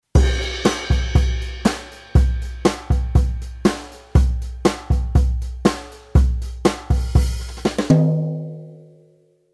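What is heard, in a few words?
Rhythm Kit Select Jazz Brush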